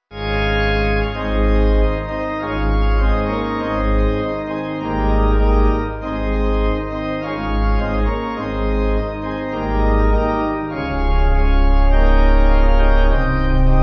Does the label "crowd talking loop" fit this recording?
No